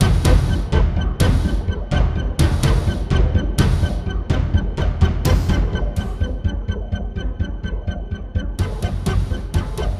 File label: sounds music; Halo Combat Evolved; Xbox